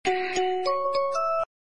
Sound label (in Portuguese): vinheta